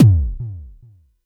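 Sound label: Mod bass drum, Jomox Airbase99, Drum Machines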